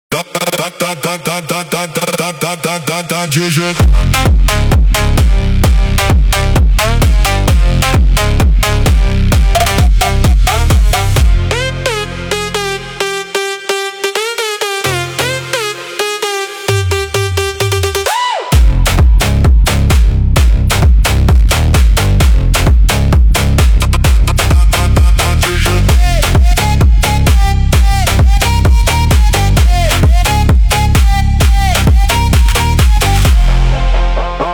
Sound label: PHONKX BRAZIL